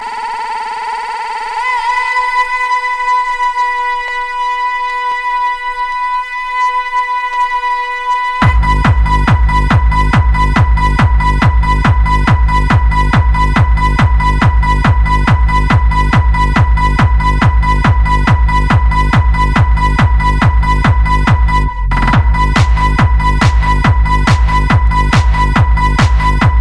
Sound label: scream